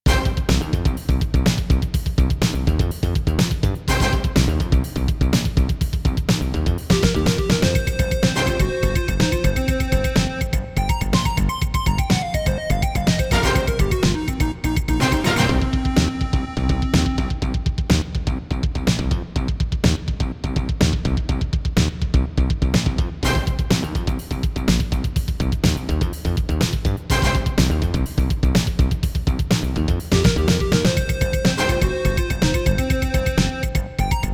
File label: CM32L; 4d sports tennis